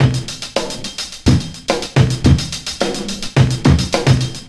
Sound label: sub nukes, Drum loops Boombap